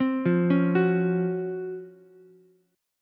Db Major 11th Db Ukulele C6; 5,5,9,9; arpeggiated; chord shape; JGuitar

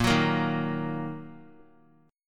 Bb Suspended 4th Sharp 5th Bb down, chord; strummed

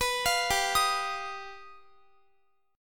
G Augmented 7th, Guitar; arpeggiated

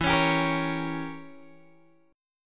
E Diminished 7th E, Guitar Drop D

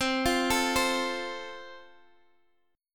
F Major, C

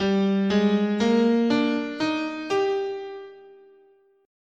G Balinese Pelog, scale

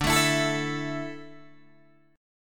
D Suspended 2nd D down Guitar DADGAD

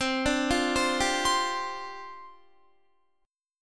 C Suspended 2nd Suspended 4th, down, Guitar; Csus2sus4 arpeggiated

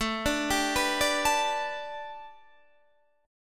A 9th Suspended 4th Guitar Open D, chord

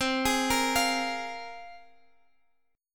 Bb 7th Suspended 2nd C

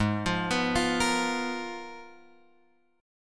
G sharp Minor 6th Add 9th; G#m6add9 Chord {4 X 3 4 6 6} arpeggiated

